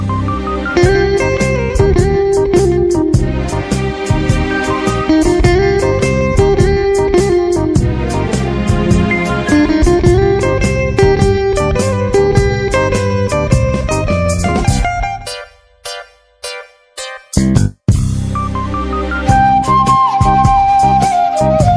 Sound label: 90s Tamil Songs Ringtone